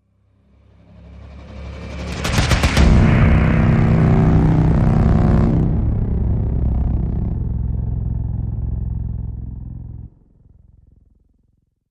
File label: Hit Stigma